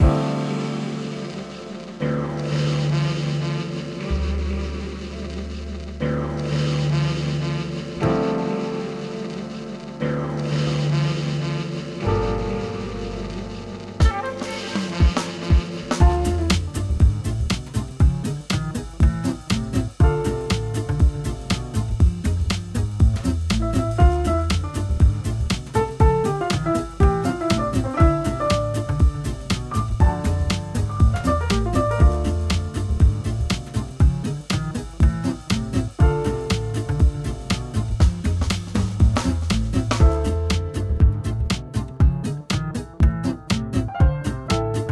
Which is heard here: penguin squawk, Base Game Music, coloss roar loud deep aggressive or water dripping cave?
Base Game Music